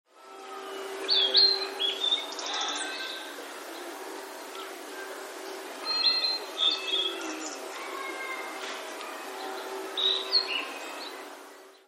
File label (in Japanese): oruri